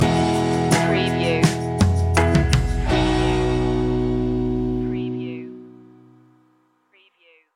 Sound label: take it on home stinger